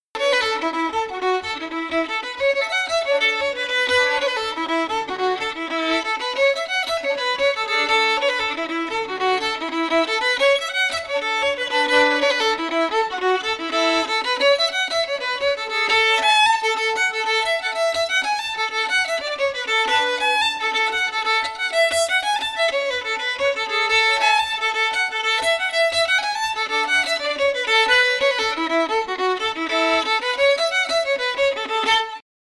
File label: fiddle; jean ireland